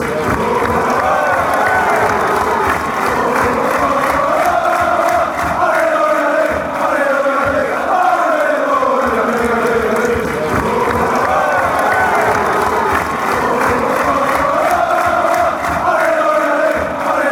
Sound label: allez, fan chants